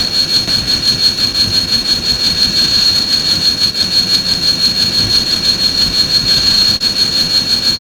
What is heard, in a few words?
white noise with ambient